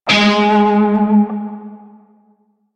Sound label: Shawzin Flourish Oct Two G Sharp; Music; Warframe; Narmer Shawzin